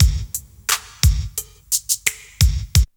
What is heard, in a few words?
break; Zero G - Man Machine